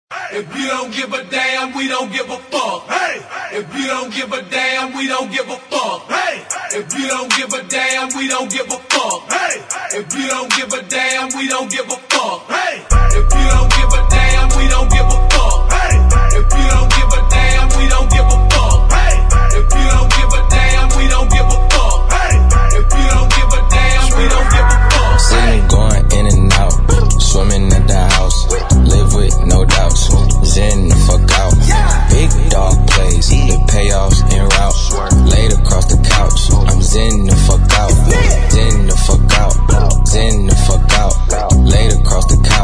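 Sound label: Acapella In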